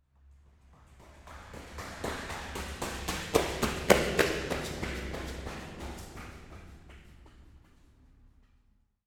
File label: person running pass in tunnel reverberant